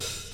smooth open high hat sample f key

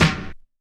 00s hip hop snare single hit g sharp key; hip hop snare drums

